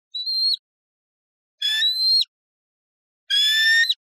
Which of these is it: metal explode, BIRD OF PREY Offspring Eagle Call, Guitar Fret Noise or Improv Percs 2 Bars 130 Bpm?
BIRD OF PREY Offspring Eagle Call